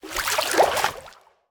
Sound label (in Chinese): 水中游泳声